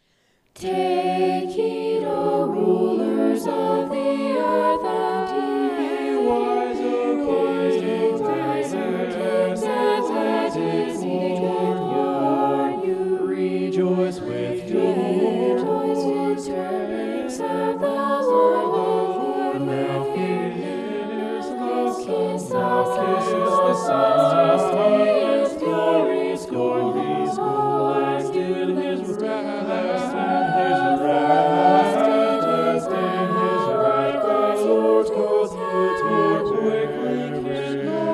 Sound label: vocal poly, Gm; psalm 2